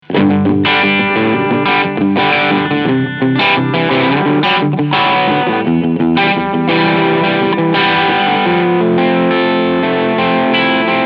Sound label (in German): bright ratio Tele steg singlecoil; one control golden acor